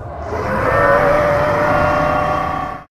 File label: Roar; Yokaiju